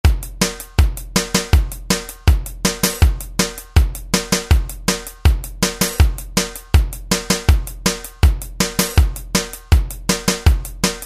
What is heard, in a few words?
Ska, Alesis SR-16